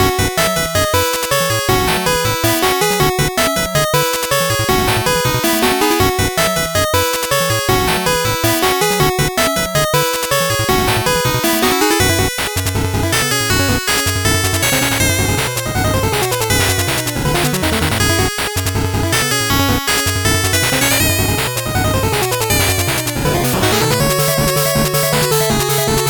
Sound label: 8bit bgm normal